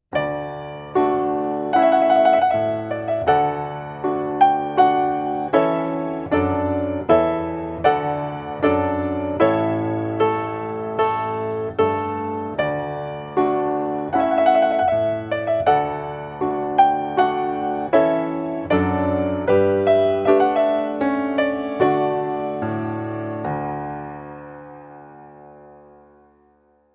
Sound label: Clarke trumpet voluntary, solo piano; wedding music